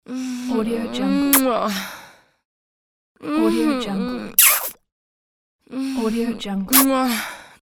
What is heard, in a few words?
kiss and moan woman